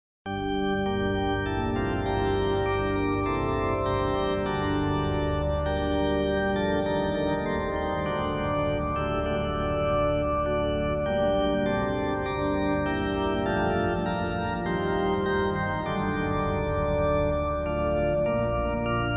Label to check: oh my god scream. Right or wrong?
Wrong